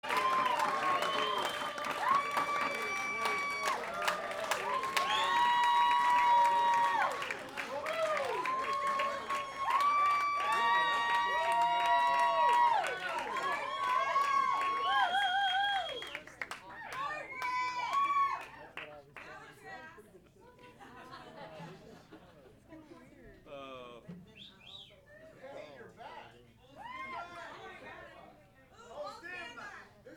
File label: encore applause